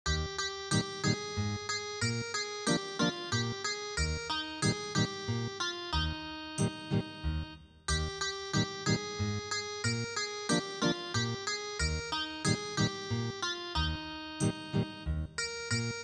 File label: Ethnic Guitar, traditional; Drink to Me Only with Thine Eyes